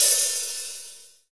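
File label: HH IH, Roland, hi-hat; 90 s Sample CDs; Sound & Vision - Gigapack I CD 1 (Roland); Real-Kit s 6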